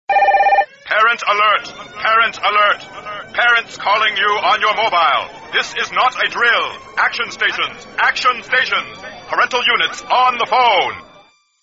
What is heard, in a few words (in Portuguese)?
Alerta Dos Pais